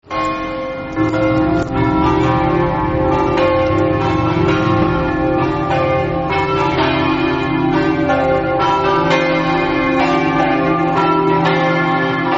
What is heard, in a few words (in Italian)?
pasian, campane